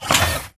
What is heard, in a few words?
Mvmt Servo Light Short; AI Stalker; Titanfall 2